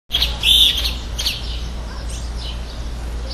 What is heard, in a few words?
Elaenia flavogaster; Ingleses; Jorge La Grotteria